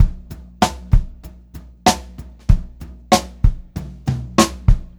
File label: Pop-Rock Drumloops; 96 POP 2